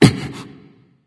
grunt hit, voicepack; smite; vamana

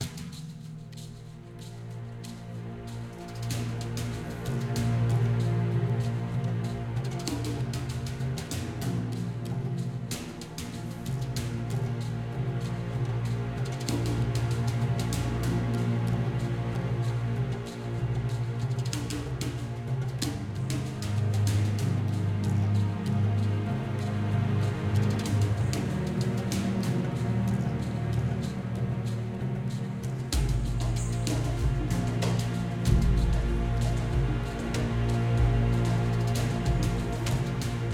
mus lp; Smite; babylon

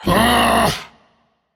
ugh; smite; ravana

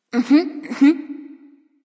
thinking; cupid

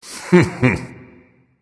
heh heh, voice; Smite; ymir skin9

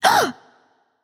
breath light; smite; voicepack; hel

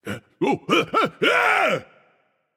fear; smite; sobek

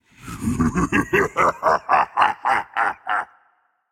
laugh, voicepack; smite; jorm

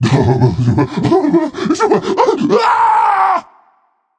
nv fear, vox; smite; voicepack; vulcan

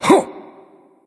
grunt attack; smite; hou yi

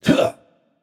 grunt attack; smite; Ullr

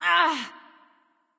grunt hit; Smite; bellona

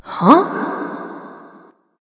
confused light; smite; voicepack; hel

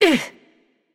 Grunt Attack; smite; nike; Buffed

